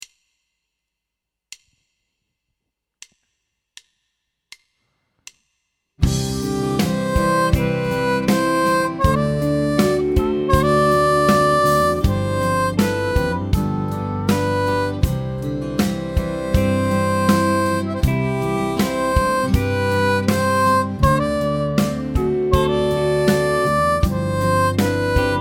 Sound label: harp